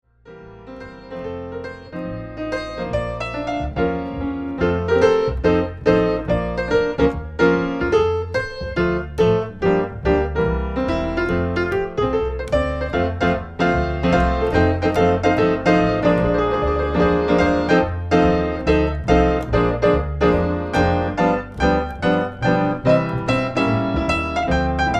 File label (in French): Duo piano et contrebasse